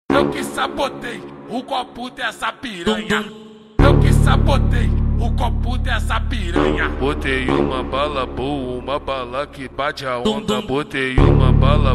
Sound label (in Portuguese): baile funk